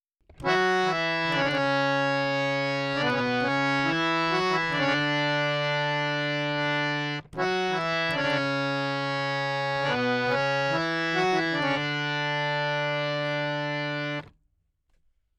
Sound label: Dry DM 4-4 70, Harmonioum; Melody